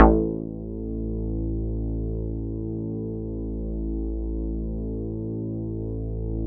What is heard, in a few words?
Synths BASS